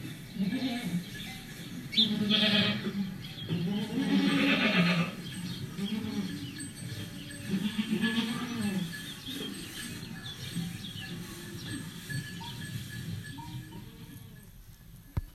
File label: goats